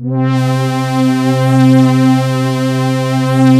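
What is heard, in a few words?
fatty sweep, JV PADS; Pro-Rec - Synthsonic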